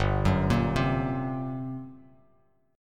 G Minor Major 7th Double Flat 5th, G, Bass Standard; arpeggiated; JGuitar